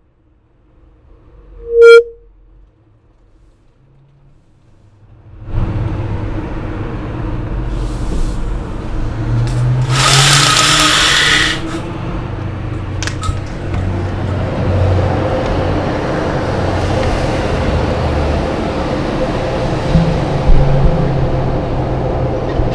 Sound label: street noise